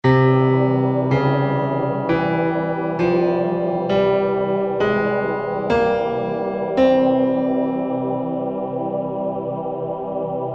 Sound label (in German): Balkan Dur